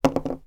foley bottle cork place down on table

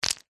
stones handful set down gently on tiled floor; foley

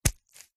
wet cloth dab squelch hard single

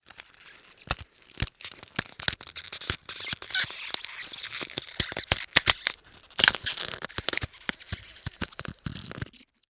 ICE Thin Scrubbed Blocks Friction

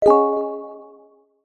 multimedia notification mallet synth dreamy

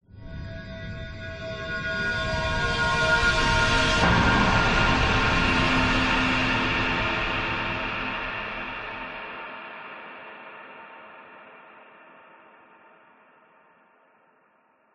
horror swell atmospheric mystery

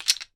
toy bricks wooden movement; sound effects one

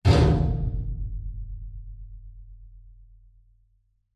impacts metal medium hit resonate lightly rattle

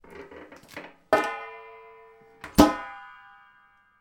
household bin lid metal open close; sound effects one

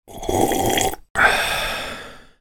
human drink slurp water followed by refreshed ahh

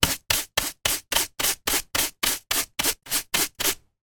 household brushing chair dustpan; zapsplat

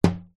household mirror finger tap knock

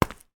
footstep single high heel; foley; zapsplat

